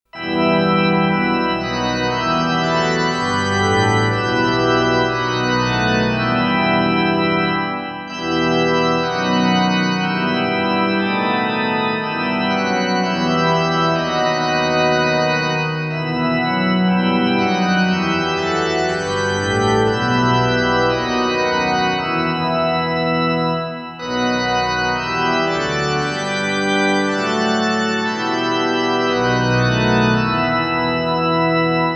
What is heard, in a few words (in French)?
Musique d'orgue; Kimagure Orange Road; Ep. 45 les funérailles de Hika